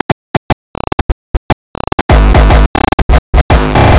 Loop, 120 bpm, Stutter Sounds; 99Sounds; Mangling Audio

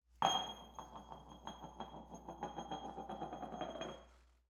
INT Metal Impact Hubcap Rolling Ring Out; Metal Movement; Coll Anderson

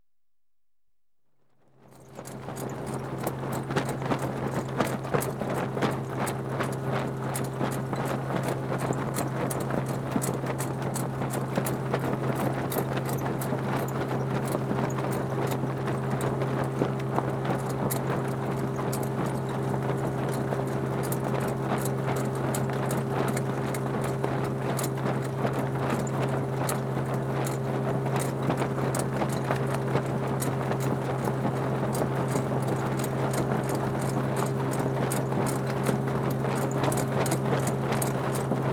Sailing Dinghy, Dinghy Towed; Ships And Boats; BBC Sound Effects Library; Ships And Boats 2

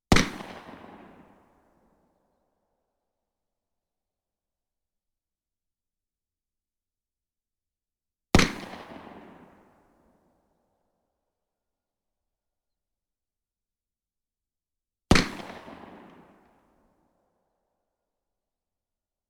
.38 Plus Power Shots, guns; Coll Anderson

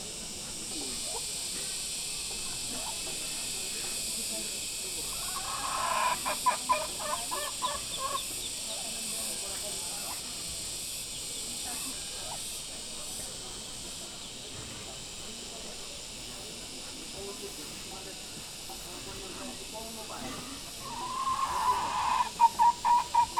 Village, Ambience - Banaue Rice Terraces; InspectorJ; Batad

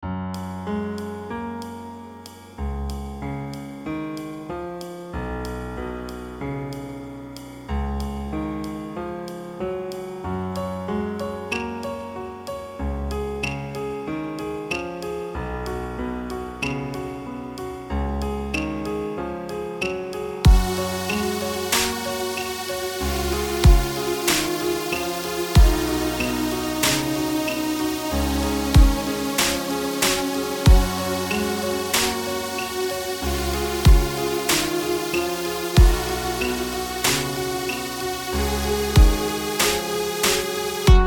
Fall Rain

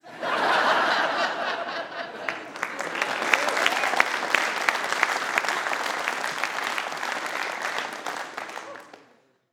theater audience laughter applause; Audience Reactions; Soundrangers

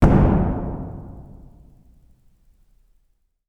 Wooden Door Slam; 99Sounds; The Warehouse

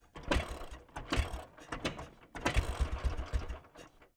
Dnepr M72 Kickstart Try Fail Engine Mix; Ural Dnepr M-72 1944; Pole Position

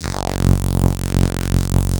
Bass drone - F#, Circuitbend; Drones - Bass; 99Sounds; Mangling Audio

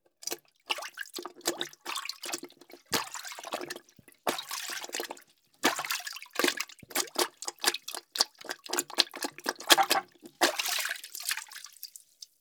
Toilet, Plunge; UberDuo; The Bathroom Audio Playset

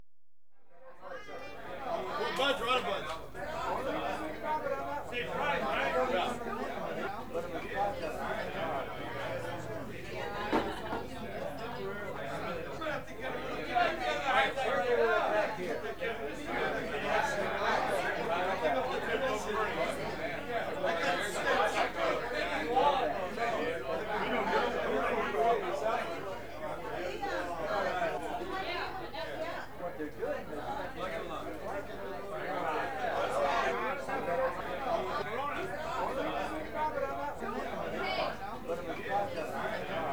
Dockers' Bar, San Pedro; America; BBC Sound Effects Library

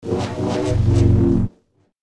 Double Bladed Saber Sound; FXHome

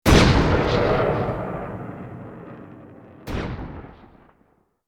Bullet Time; FXHome; Bullet and Gun

